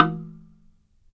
HRD SLIT DR, Heart of Africa; SLIT DRM2

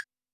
WOOD BLK, Yamaha SY85, Drums

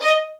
D# 4, soft violins; Q Up Arts; Denny Jaeger Master Violin Library

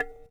BND GONG; ILIO - Ethno Techno

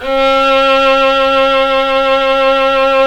Synclavier Strings; ILIO

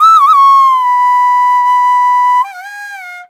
VENU, India Winds; E-MU Producer Series Vol. 7 – Old World Instruments; World Combos

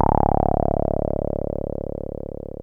303 C1, TB 303

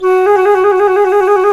C flute trill

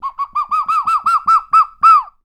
BD CALL, BIRD CALLS; Voice Crystal - Steve Reid Definitive Percussion

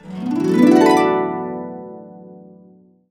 D, Harps; USB Soundscan vol.62; AKAI

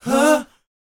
BOYZ A#, Vocal Planet R&B & Dance; ILIO

